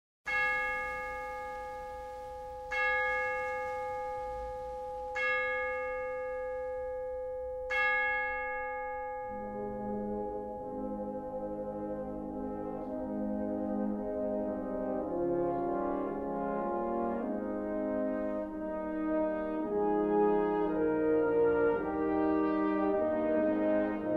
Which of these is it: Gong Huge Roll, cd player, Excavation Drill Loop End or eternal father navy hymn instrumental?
eternal father navy hymn instrumental